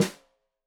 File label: Snare Stereo, Drums; Deeply Sampled Drums; SMDrum